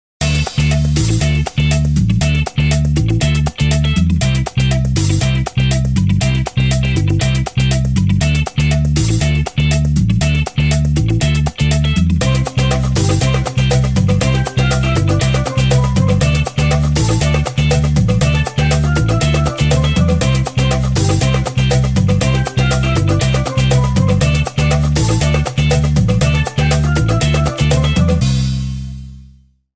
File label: calypso